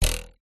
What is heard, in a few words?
cartoon bounce jump ruler twang